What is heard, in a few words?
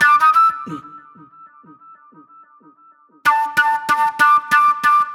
Sharp Delay Flute